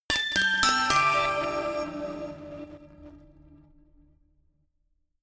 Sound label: notification glockenspiel crazy sequence descend; instrumente